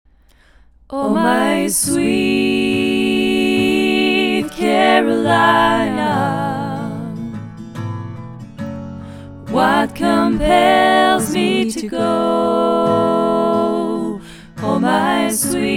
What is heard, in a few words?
all voices